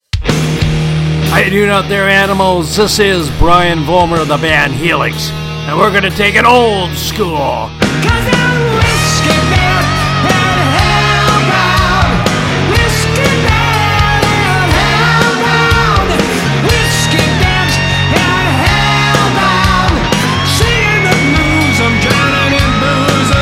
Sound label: music sample